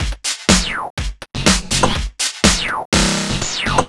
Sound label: 123 Full, Drum Loops 123bpm; Random Phunk; Nu Dance Electro